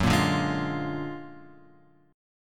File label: F sharp Augmented Major 9th F sharp; F#+M9 Chord {2 1 0 1 X 1} strummed